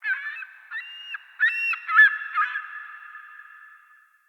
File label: Beach Bird Distant; Midrath Beach